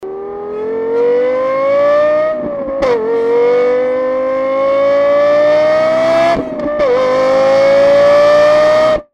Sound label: LFA Sound Accelerate; lexus